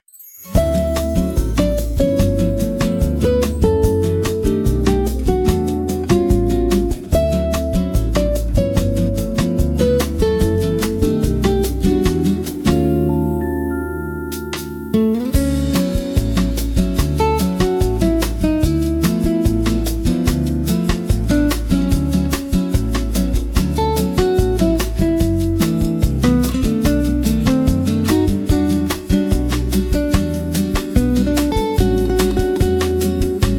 ocean breeze bossa a gentle brazilian jazz soundtrack; bossa-nova